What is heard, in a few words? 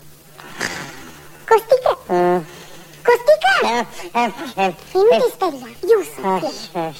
Squirrel